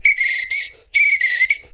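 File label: foudang groove; Best Service; World Colours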